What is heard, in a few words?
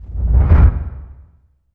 IMP FLAME, Cinematic Impact